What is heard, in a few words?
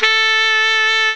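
soprano sax; REEDS2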